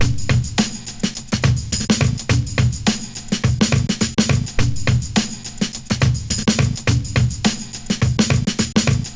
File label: 105, Breaks; loops; 105 BPM; Loopmasters; Jon Carter & Alex Blanco; Block Party Essentials